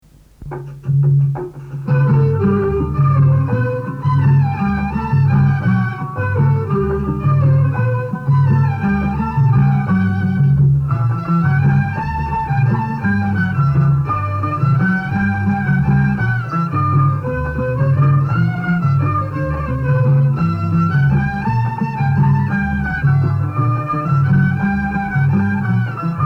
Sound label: Instrumental